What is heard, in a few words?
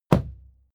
fight impact sweetener punch low; krieg-waffen; nahkampf; soundpool